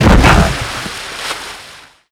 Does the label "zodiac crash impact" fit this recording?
Yes